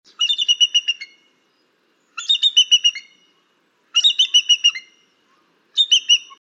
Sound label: bald eagle; emiquon virtual tour; birdwatching; illinois